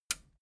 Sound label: metal button radio push disable